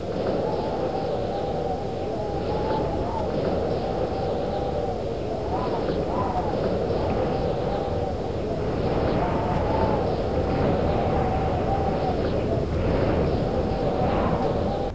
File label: wind